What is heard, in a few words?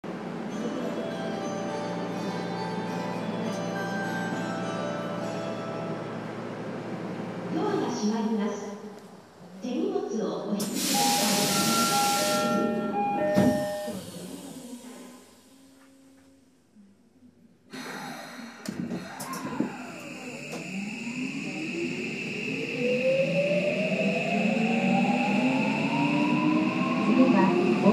vvvf, mitsubishi